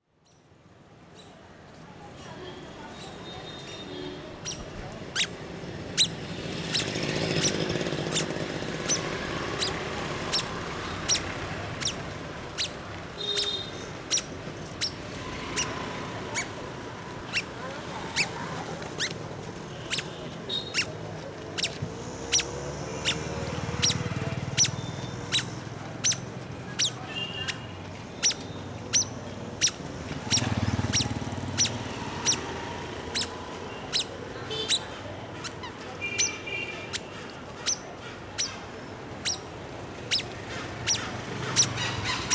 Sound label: klaxon shoes